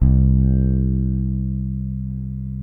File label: BASS C1, Electric Basses; PlatinumPhattCD2; E-MU Producer Series Vol. 8 – Platinum Phatt